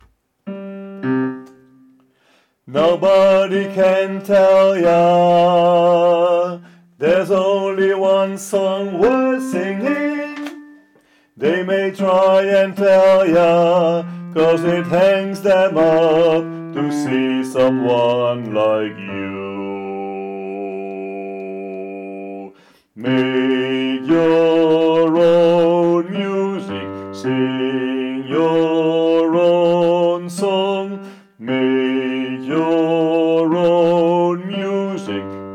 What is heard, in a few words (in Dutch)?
bas; oefenfiles